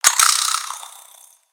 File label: Spider Die; Lego; creatures mechanical